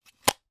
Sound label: household audio cassette tape case close